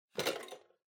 Kitchen Scale Main Unit Pick Up

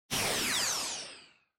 Motion Speed Lines Mid

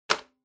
Tabletop Timer Digital Put; タイマー機器

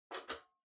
Flip Clock, Far Button On

